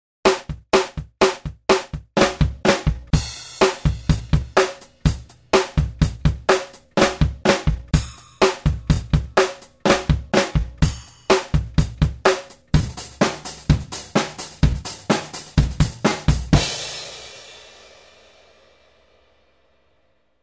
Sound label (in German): Rhythmus